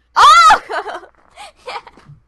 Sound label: OHH! hoho... yeah